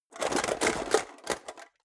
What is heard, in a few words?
metal box small shake rattle; maschinen; metall; soundpool